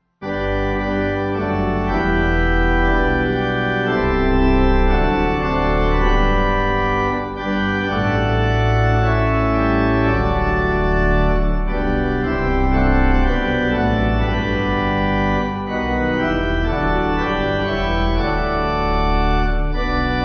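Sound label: Pipe Organ, Music Backing Files for Churches; Let All the World; Gloria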